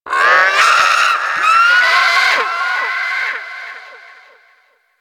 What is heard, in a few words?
Bird Of Prey Lure Call Success; Warframe; Hunting Critters